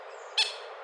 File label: Gallinula chloropus threat; Common gallinule; A single guarding call of a common gallinule toward a grey heron flying above